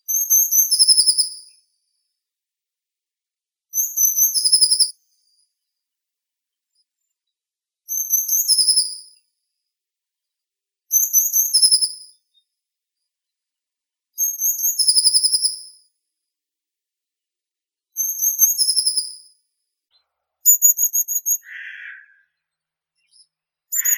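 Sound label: blue tit, Cyanistes caeruleus; lazorevka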